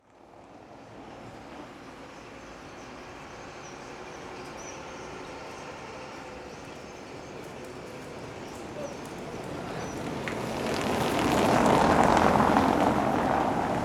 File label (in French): PAYSAGE SO NORD DOUAI Au Beffroi